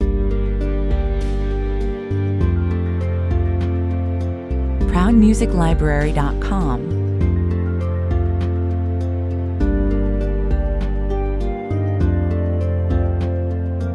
sad reflection 100 bpm

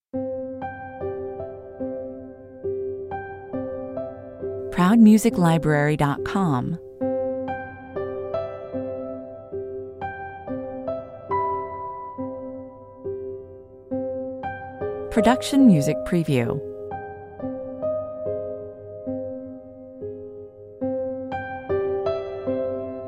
solo piano

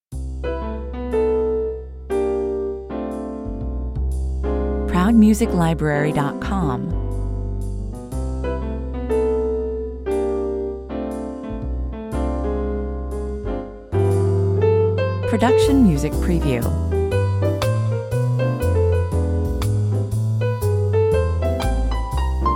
coctail groove

